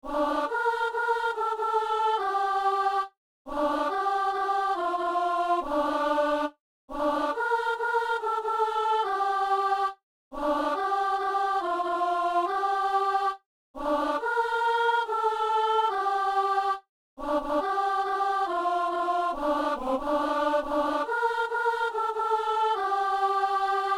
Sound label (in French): alto chant